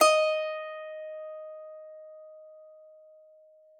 PSAL D#5, PSALTER; Xsample VOL-8

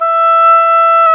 oboe; Roland LCDP04 Orchestral Winds; Oboe Brth 1

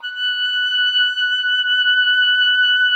OBOE F#6; Xsample VOL-6

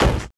SD, 160 BPM; Ueberschall; Drum & Bass Flash Trackz

